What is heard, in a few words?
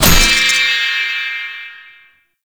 Medusa Puzzle Orb Shot Start; Warframe; Duviri